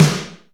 Reverb Kick Snare; PS-37 Dance Synths; Percussion

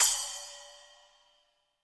SPLSH, SPLASHES

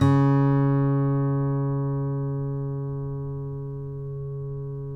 COLLINGS, Guitars; Spectrasonics; Hans Zimmer Guitars VOL-2; FNGSTYLE PFM

